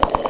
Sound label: FLIPPER; HIGHTECH